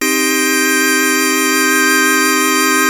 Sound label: vox, dx7